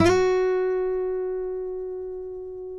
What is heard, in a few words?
F#, guitars